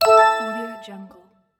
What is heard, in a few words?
pings